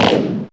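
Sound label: Machine Gun, Fairlight CMI IIe; Effects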